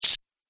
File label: Android Finger Motion, robot